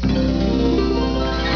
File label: curtain; usa